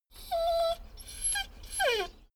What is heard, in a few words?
dogo argentino whine whimper; natur-tiere; hunde